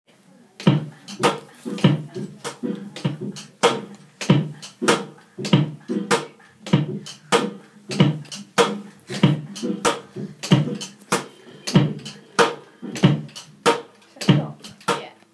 drum beat

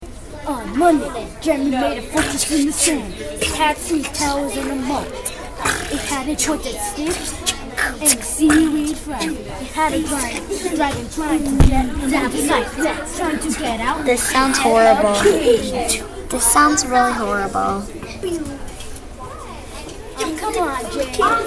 rapper worst rapping

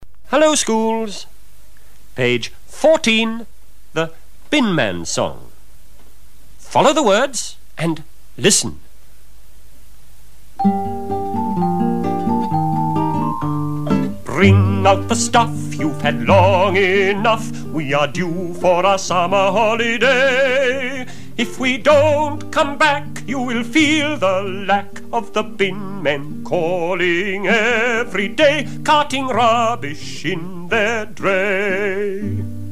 singing together; radio 4 schools

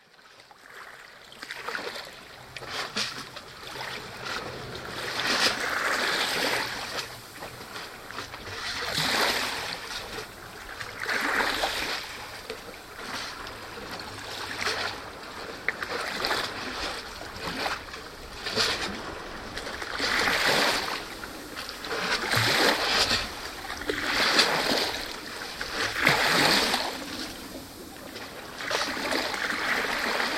ferry jetty; kerrera uksm

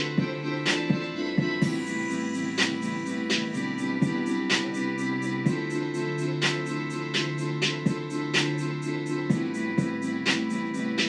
beats

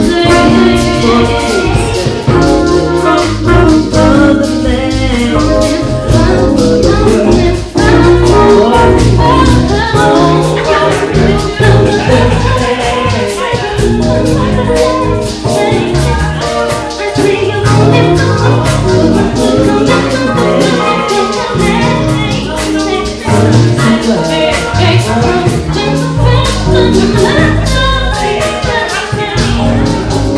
open mic jam

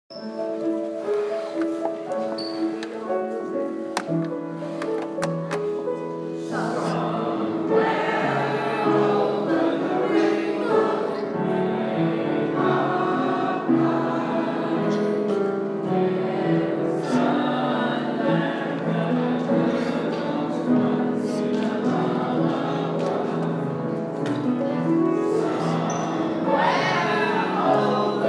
school choir; lansdowne

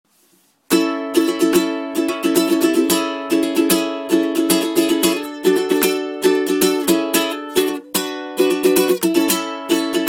jamming on the uke in a

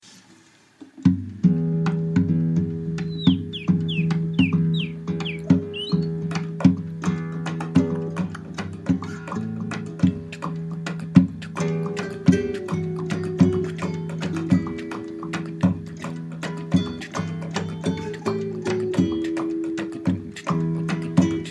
guitar perc impro feat pringles can

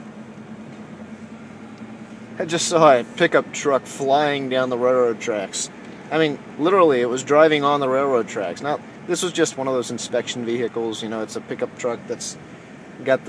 pickup train

